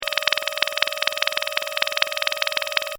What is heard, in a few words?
Scifi SFX Loop, Short; Sci-Fi (Sound Effects:Science Fiction); Stuart Duffield